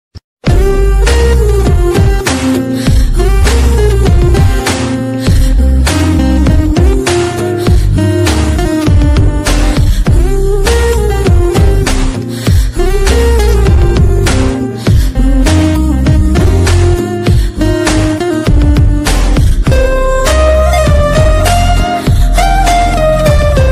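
background music ringtone trending ringtone new ringtone; raja rani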